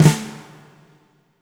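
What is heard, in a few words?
fat snare left; Spectrasonics; Burning Grooves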